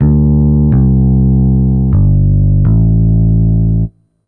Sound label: DEEPHOUSE BASS 125 A